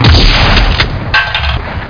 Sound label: kick; amirc